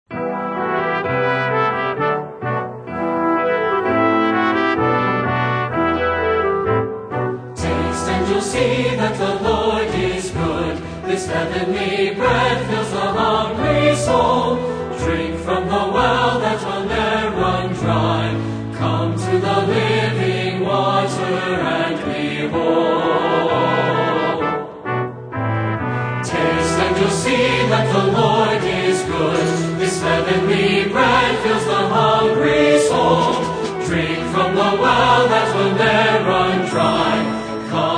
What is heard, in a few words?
Singers And Orchestra